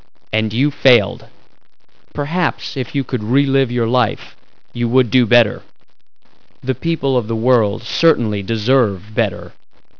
lose

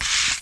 SLIDE OUT; PaxImp

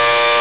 in car, Bike; CASTROL

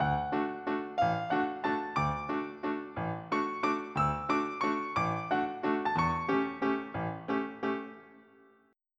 waltz

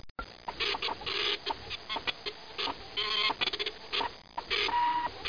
PRINTER; 12000 Graphics Pack; Media Graphics

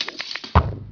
crossbow fire; vampire demo

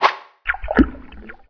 Splash

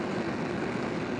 flame pilot, flame thrower; KINGPIN; weapons

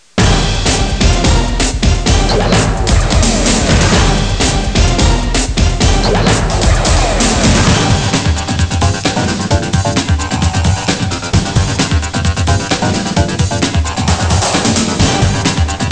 battle

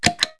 push; KABOOM! - Wild and Wacky Sound Software; house

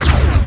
SHOT

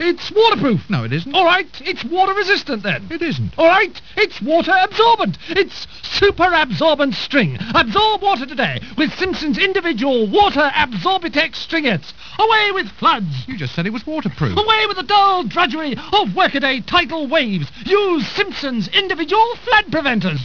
STRING

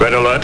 red alert; OS/2 desktop

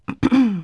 ahem; Wired for Sound Pro CD; Aristosoft, Inc.

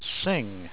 sing

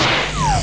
Cyan Death; Icebreaker

